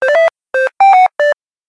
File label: Ringtone